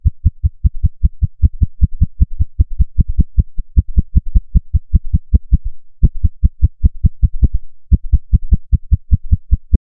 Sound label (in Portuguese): som de batimento cardaco, pulse